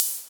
Cymbals Ride; Vengeance Samples

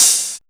Cymbals HH Open; Vengeance Samples

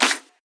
Claps; Vengeance Samples

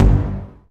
Synth Bass; Synth Shots; Vengeance Samples

